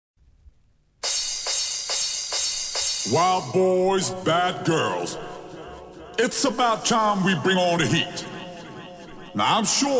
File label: speed it up acapella